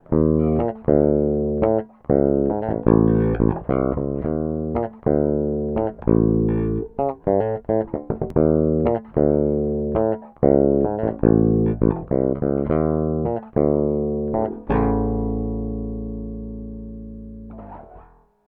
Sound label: Midboost Bridge